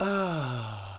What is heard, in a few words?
Archer Heal; Sid Meier's Civilization 4 Complete; Warlords; Units